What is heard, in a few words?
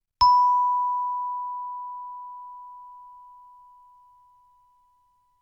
Chimebar B